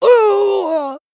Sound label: Male Human Death